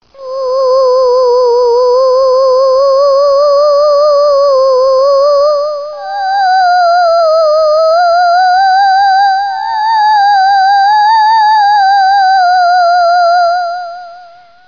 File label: Crying of Ghost